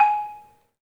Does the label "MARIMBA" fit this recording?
Yes